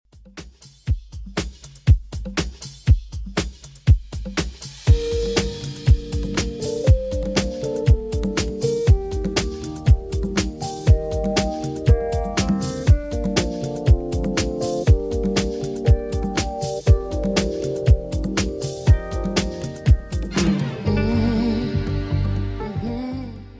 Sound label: 70s Redrum, 120 bpm